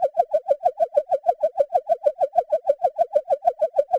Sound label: Chirp FX DX100 G1; birds